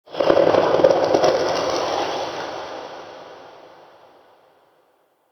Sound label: Crypt Cold Texture, Ambience; Champion Crypt